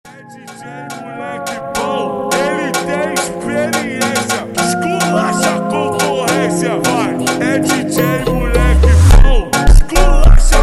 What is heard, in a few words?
Funk ultra Slowed